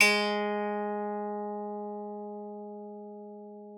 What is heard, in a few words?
PSAL G#3, psalter; Xsample VOL-8